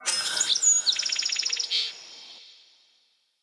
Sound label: UI Summon Sparrow